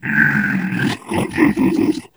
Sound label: ballbot devious laugh; player; Portal 2